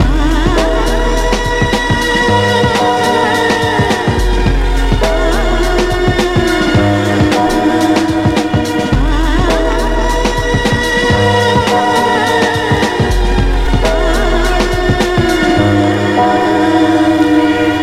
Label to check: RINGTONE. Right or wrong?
Right